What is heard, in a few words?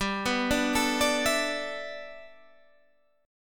G 6th Add 9th G Guitar Standard, chord; G6add9 arpeggiated